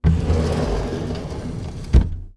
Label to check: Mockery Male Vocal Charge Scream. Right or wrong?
Wrong